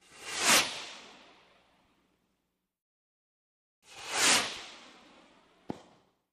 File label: Rocket Flare Gun Fire, small rockets; Rocket Flare Gun: Fire By. Stereo